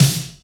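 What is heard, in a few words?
TOM snare drum; Best Service Hyper Dance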